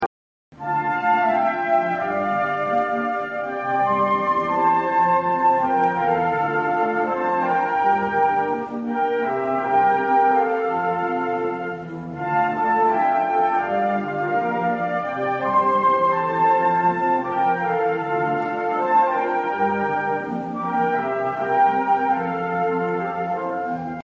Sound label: concert, flute